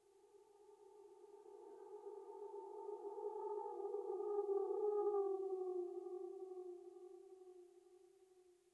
Jingles REVERSE STING; Semblance

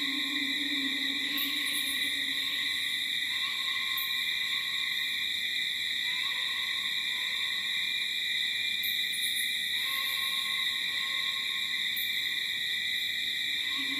BGM Night; Granblue Fantasy; Android

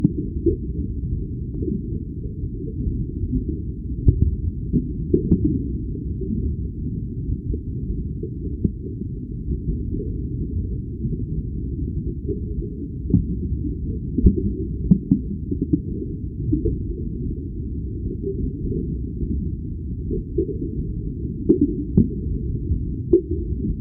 Under Water Ambiance

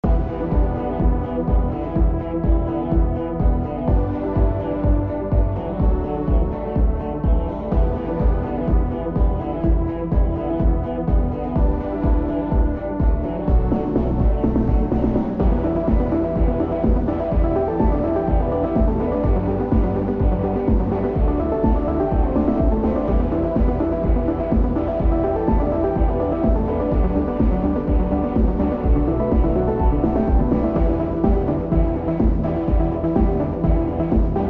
Dance (Muffled); Hollow Dance

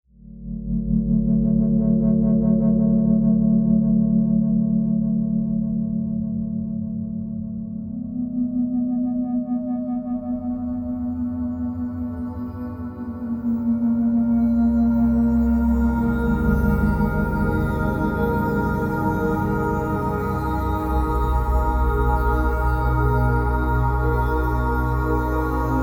Deep Breathing; App Maldita

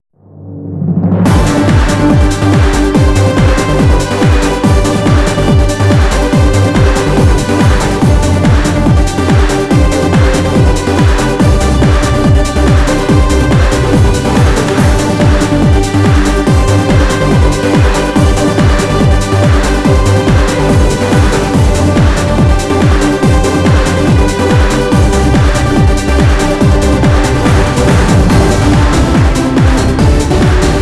Trance Anthem; pro evolution soccer 6; ps2

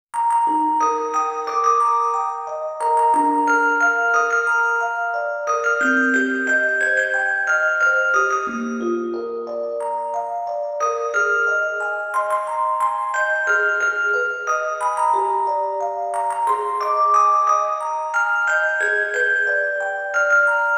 Destiny -orgel-

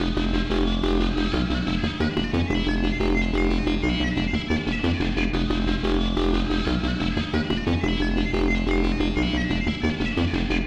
Exit loop; rive wreck hack die retry; ps4; Crusherchamber

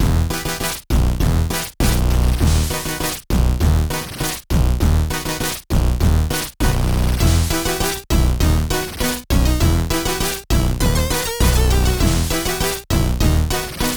music intro loop

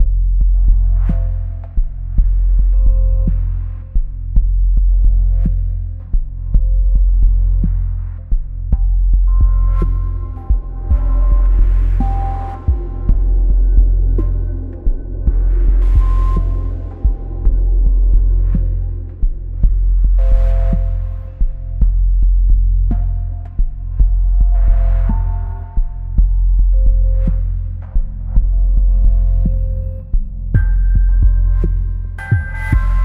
Scifi music; assault spy windows gamerip 2018; Scifimusicpack Music Alpha

